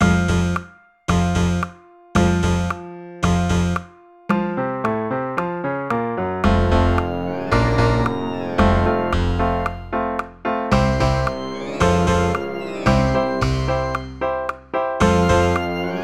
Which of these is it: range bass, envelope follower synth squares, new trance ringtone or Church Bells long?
range bass